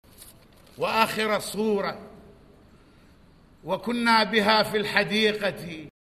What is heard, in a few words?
loop